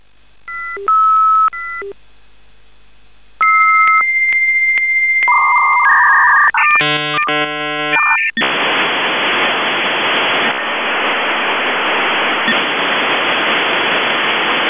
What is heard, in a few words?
v34bis, modem